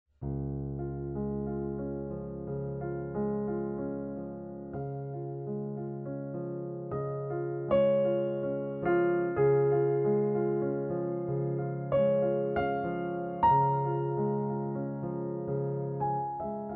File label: Steinway NY prelude